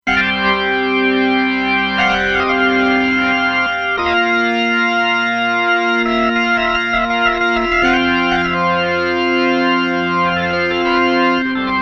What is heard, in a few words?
JC Organ Original; Sonic Farm Xcalibur